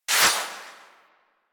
Full Draw Tail, Weapons; Warframe; Infested; Inf Cernos

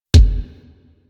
Bronco Fire Shot Thud 2D; Warframe; Weapons

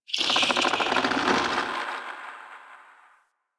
Tendril Tree Creaks; Warframe; Infested Eris; Ambience

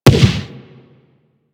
Shrapnel Shotgun Fire; Railjack

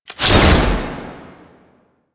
Ammo Cast Whoosh; DE; Warframe; Frumentarius

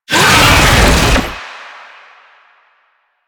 Infested Oni Melee Start; Warframe

